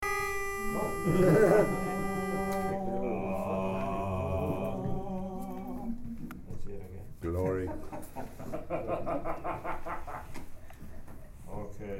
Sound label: Barbershop Quartet; Toronto